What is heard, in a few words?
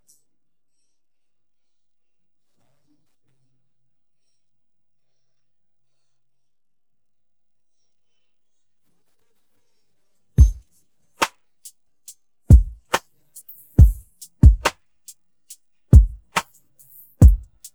drums stem